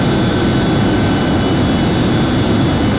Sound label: JET